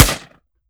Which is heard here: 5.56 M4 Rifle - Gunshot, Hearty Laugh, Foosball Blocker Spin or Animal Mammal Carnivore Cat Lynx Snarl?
5.56 M4 Rifle - Gunshot